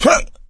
ledge hang slip; voice; source engine; left 4 dead 2; survivor

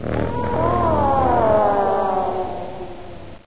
child die; video game; source engine; hl1; gonarch

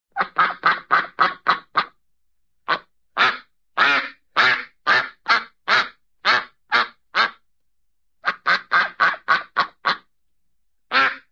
bird, duck single duck quacking, animal; cartoon

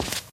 white tile, footsteps

